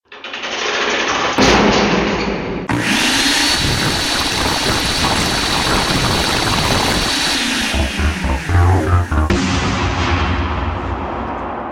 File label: Jingle; Super de-douche